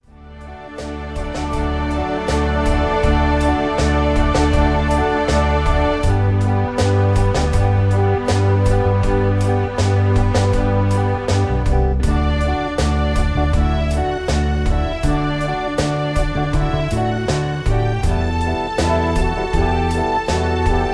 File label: Karaoke